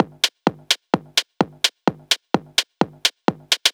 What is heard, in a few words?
Loop, 128 BPM